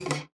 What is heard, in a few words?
GUITAR FX; Xsample VOL-2